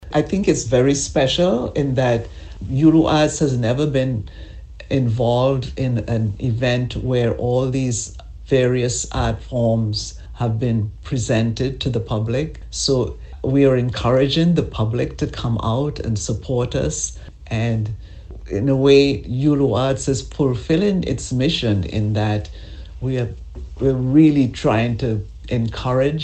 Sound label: MUSSER DRUM